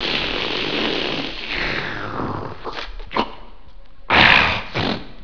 sucking

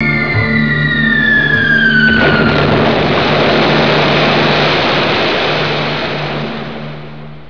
explosion

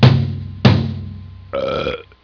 beat beat burp

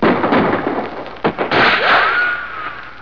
gunshots

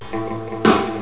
guitar drum

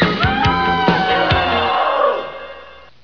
screams

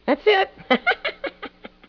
thats it laugh

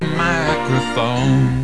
microphone

